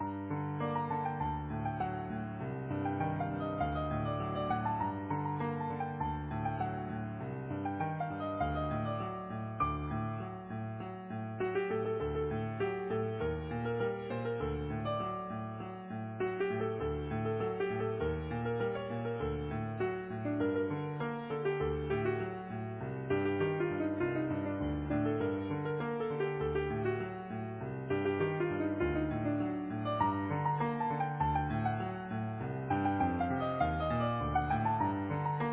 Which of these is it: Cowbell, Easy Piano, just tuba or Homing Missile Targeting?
Easy Piano